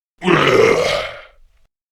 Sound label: Death Grineer Male; Warframe; Battle Barks